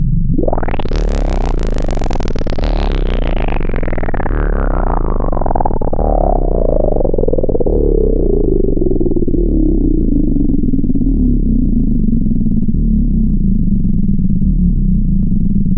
LOW FARTY, HORRID; Zero-G Ambient 1; HORRID+FARTY

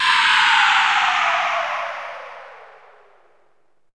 SYNTH FAL; AMG - Vince Clarke Lucky Bastard

